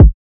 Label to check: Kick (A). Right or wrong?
Right